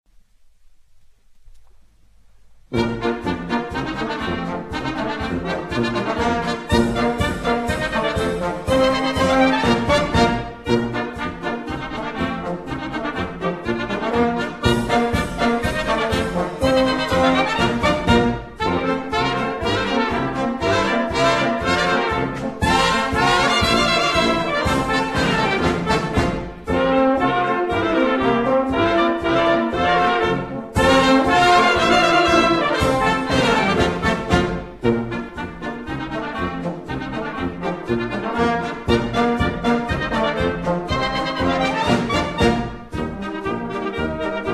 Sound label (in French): Vive la polka